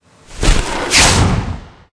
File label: elecbird attack